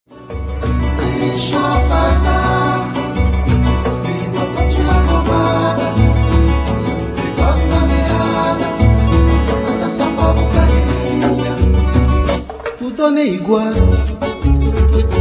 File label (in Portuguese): cordas